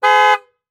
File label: Transportation Jeep Commander Horn Short